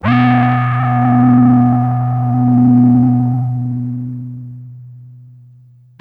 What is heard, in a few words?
Synth, Foggy Horn 90s